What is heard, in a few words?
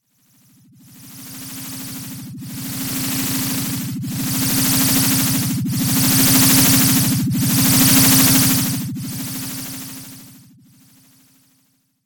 science fiction helicopter approach away; sound effects one